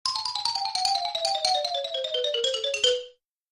Xylophone Down

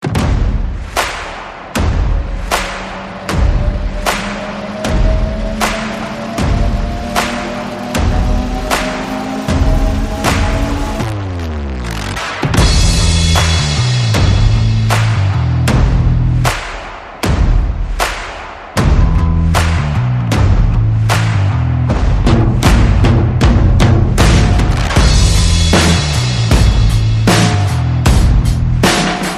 Percussion Bass and Effects

